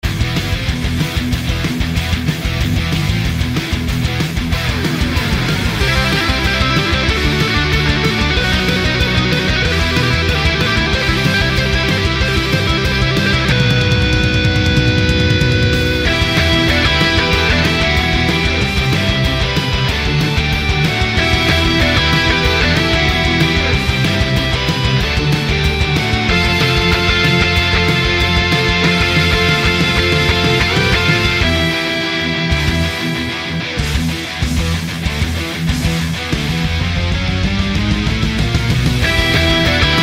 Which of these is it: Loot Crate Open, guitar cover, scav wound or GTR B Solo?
guitar cover